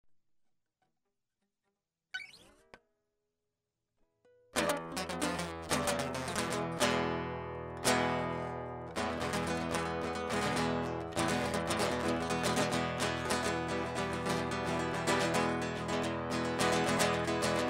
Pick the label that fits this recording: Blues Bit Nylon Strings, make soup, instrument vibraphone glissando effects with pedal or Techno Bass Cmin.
Blues Bit Nylon Strings